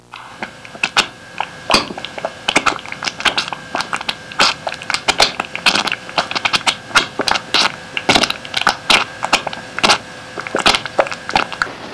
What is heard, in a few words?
SPAGHETT, HAUSHALT